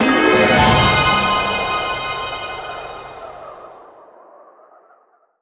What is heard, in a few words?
Boss Intro Stinger; Skylanders Cloud Patrol